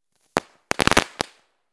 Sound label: Firecrackers